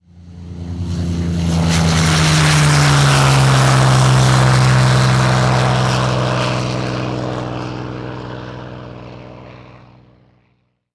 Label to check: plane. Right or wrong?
Right